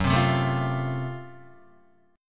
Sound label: Gb Minor Major 7th Flat 5th, Guitar; GbmM7b5; strummed; 1,3,0,2,0,1; 4F,4A,5C,5G,5C,6E